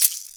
Ganzeiro, EMU Orbit-3 drum machine; 300 Drum Machines

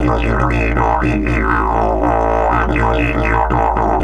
120, Didgeridoo

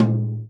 tom, Real Drum Kit, jungle kit

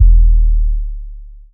BD, Drums Dance & Groove; USB Soundscan vol.11; AKAI; ALL BD

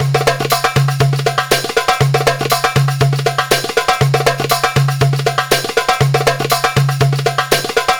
PERLP, ArabianTraditions; USB Soundscan vol.43; AKAI

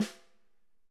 Ludwig Snare; Digital Sound Factory

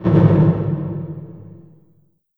LH HT909, REVRB LARGE HALL; TR 909

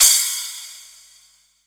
CYMB, Drum & Bass, 135 BPM; Ueberschall; Drum & Bass Resonance

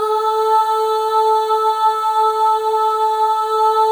AH, FEM; Spectrasonics; FEM.2 AHS

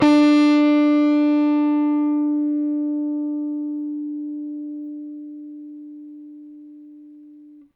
Rickenbacker Bass; Airwindows

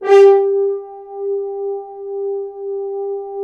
BRS, FHns Accent; Roland L-CD702; Accent-Swell

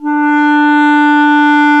D3, REED; AKAI S-Series CD-ROM Sound Library VOL-8

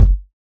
Kick, Dance Drum Kit

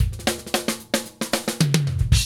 FILL, Funky Ass Loops

